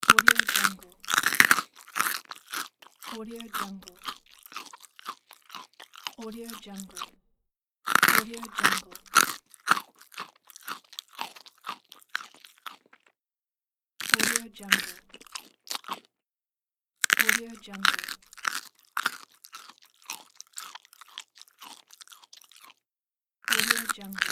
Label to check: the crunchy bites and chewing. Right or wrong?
Right